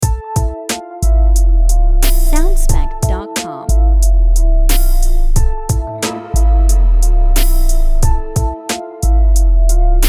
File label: Triphop Mystic